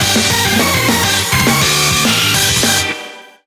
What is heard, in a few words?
Bgm Reach